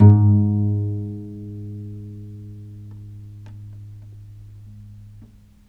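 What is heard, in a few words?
VIOLE PIZZICATO G#3, Historical Instruments; USB Soundscan vol.23; AKAI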